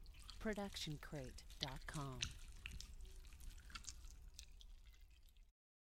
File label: stylized squish; soundscrate